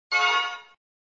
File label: Sound SFX, flipper